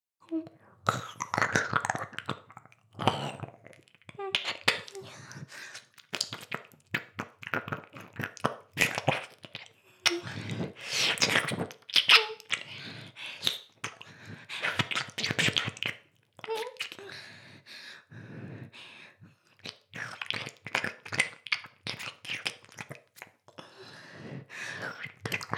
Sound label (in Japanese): 耳舐め; 全妹計画２; 【メスガキ妹】わからせてくれるのよねぇ～?おにぃ～・ちゃん♪【全妹計画<オール・シスターズ> 2妹め】